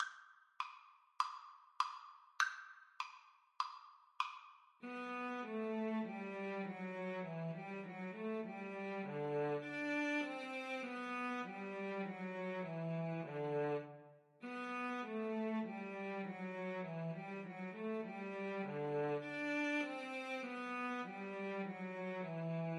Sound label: Cello Duet